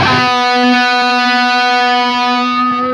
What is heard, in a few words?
LEAD B 2, Guitar; East West; Steve Stevens Guitar Samples Collection